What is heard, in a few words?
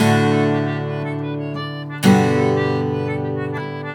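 guitar, 120, Celtic; USB Soundscan vol.42; Celtic Flavours; AKAI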